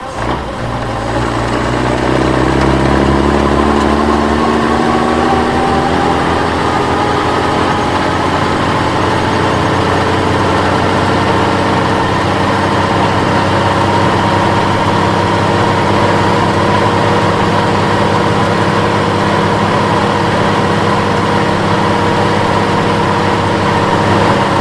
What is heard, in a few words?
reverse, vehicles